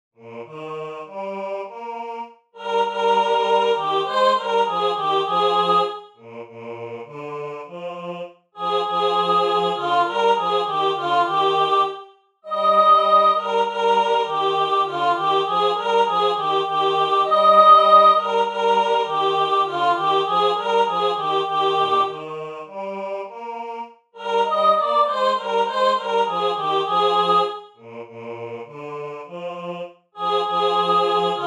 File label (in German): sopran; Wer klopfet an